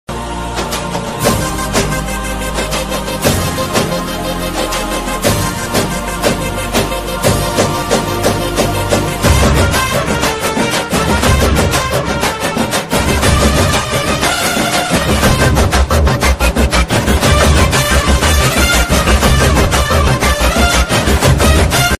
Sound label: Tamil ringtone; sandakozhi